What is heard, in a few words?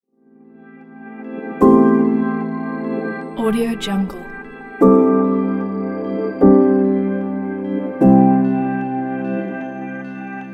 documentary background